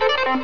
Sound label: TURN